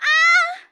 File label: dead, monster